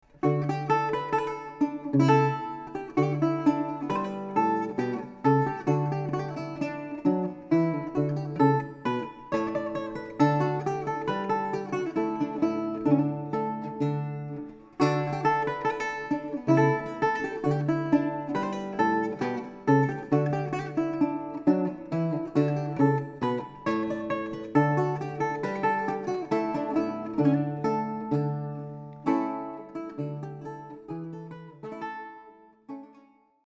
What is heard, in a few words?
Lute; Princes Mask